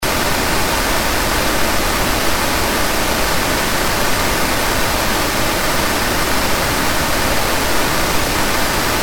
White Noise Full Spectrum

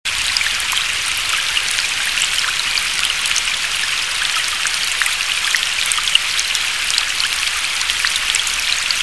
Rain

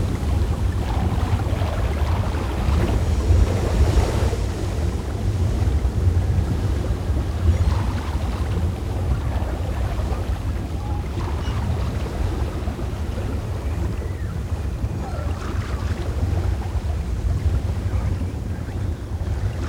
Dolphins; Baby Oasis S-580

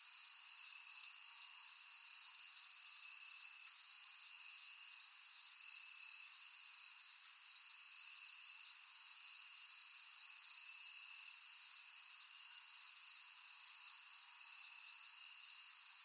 Summer Night

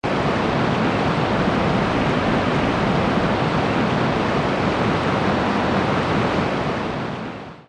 White Noise

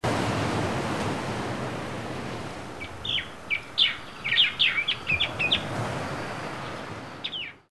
Island Surf

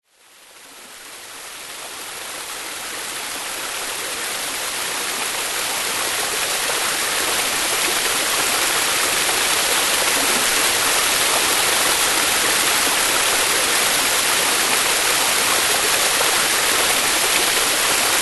white stream; sound oasis

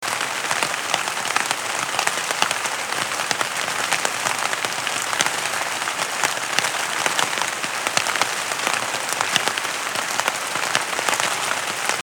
Wilderness Retreat Forest Rain

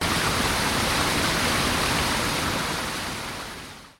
Spa Retreat, WATERFALL

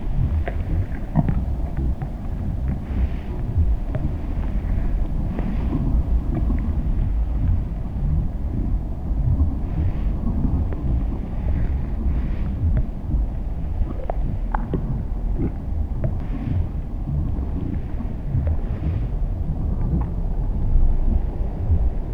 Baby Oasis S-580 Pre-Natal

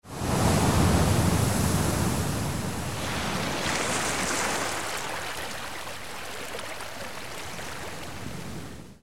Gentle Surf Hidden Cove; Rythms of the Sea

